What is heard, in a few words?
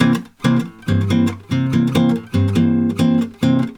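C 7 guitar, Brazil Bossa; USB Soundscan; AKAI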